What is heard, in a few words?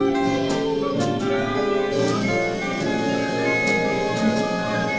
music 16kHz